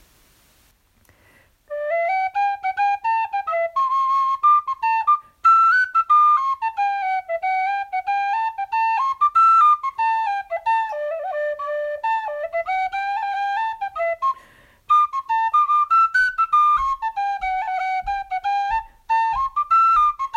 Garrett Barrys Jig

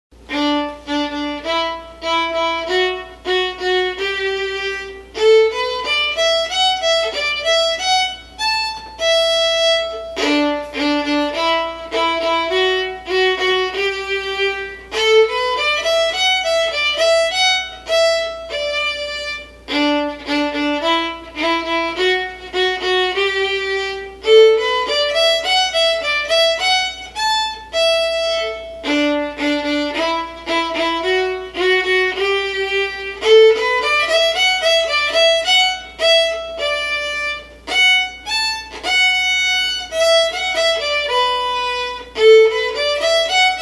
Spotted Pony slow

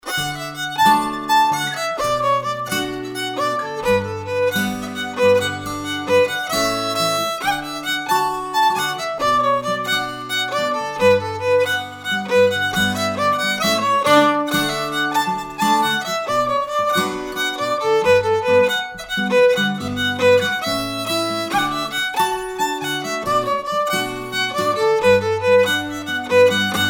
La Moquine slow; MCDFW, introduced in 2020

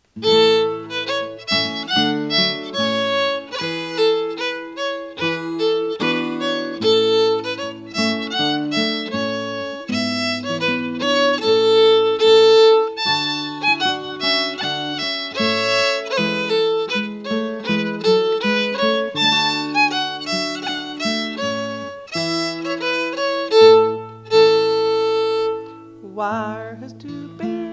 Bonnie Tammie slow

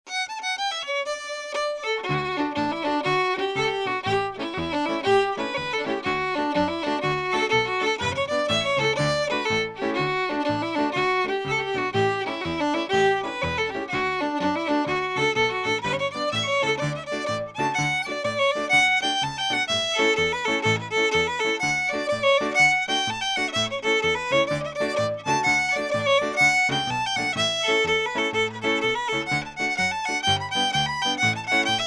Fogartys Jig, fiddle

